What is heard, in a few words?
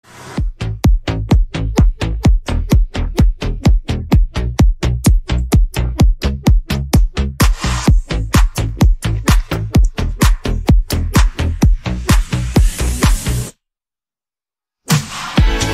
Instrumental